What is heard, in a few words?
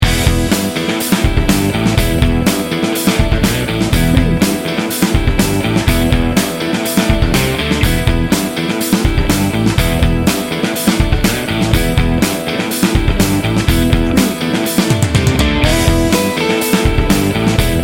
bouncy funky rock